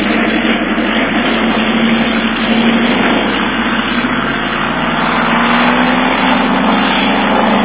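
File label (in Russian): звук работы нескольких двигателей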